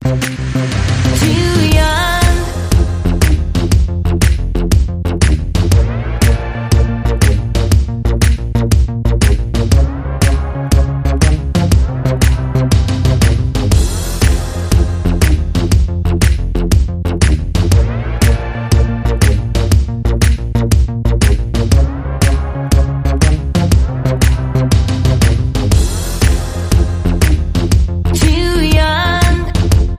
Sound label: Pads